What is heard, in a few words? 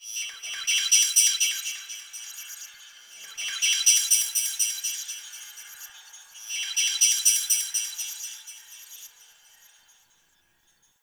Grain Bird; Warframe; Tau